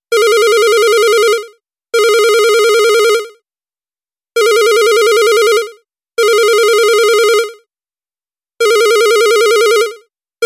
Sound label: Different old phone